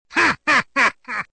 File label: hahahaha; neogeo